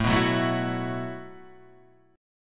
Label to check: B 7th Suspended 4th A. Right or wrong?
Right